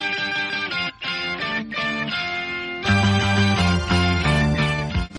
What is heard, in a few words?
japanese turning ringtones